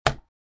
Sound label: drop sound